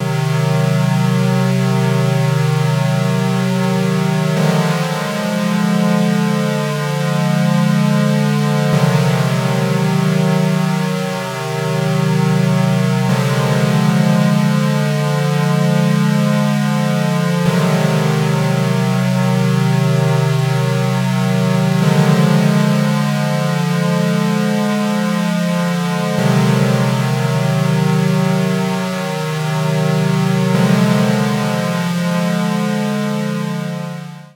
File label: OB6 P6 Waveform Pulse